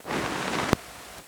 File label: Tape Noise